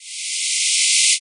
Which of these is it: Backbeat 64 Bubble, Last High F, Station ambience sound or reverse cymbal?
reverse cymbal